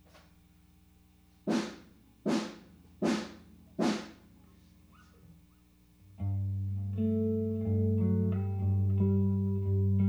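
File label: SM57 mono Bass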